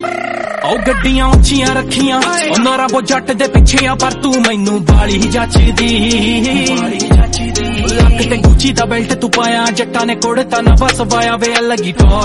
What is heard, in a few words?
punjabi song